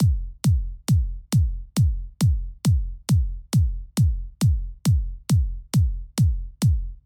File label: Kick mix